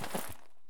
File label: item flakes foodtake; Escape from Tarkov